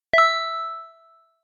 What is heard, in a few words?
text receive